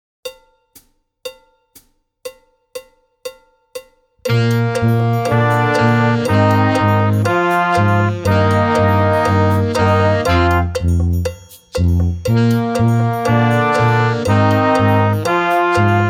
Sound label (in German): ohne Drums